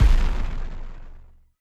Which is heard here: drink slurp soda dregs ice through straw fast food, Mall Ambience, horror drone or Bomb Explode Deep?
Bomb Explode Deep